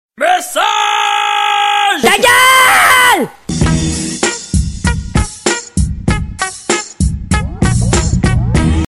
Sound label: Message